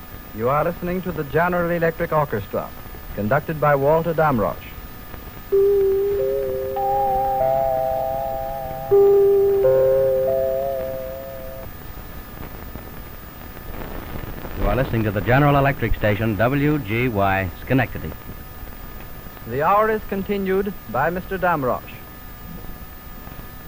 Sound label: chimes and ID; NBC; WGY; 1929; NBC 7-note chimes